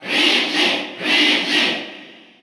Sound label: Cheer Russian; Ridley; SSBU